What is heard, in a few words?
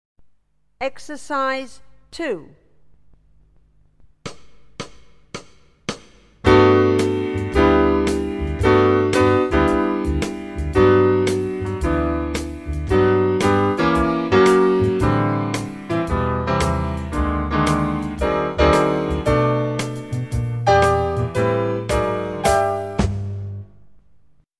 primary tap set exercise shuffle ball change